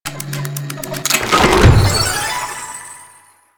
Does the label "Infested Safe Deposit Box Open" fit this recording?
Yes